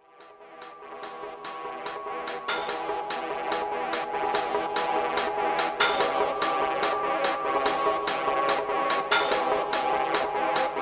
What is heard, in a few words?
Trance